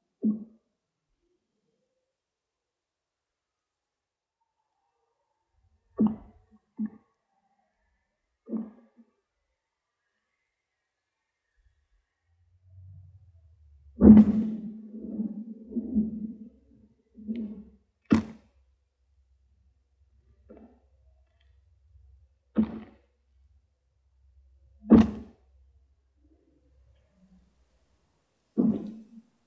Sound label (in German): Eisgeraeusche, nachts; Dachau; nachts-18Grad; tags-10Grad; Schnee; Silberreiher Casmerodius albus; Vogelstimmen